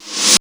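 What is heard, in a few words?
reverse snare, drum; Crypton - DrumBase5000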